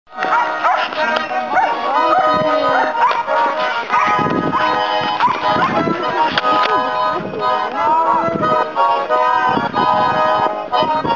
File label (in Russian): bayan; suzdalskiy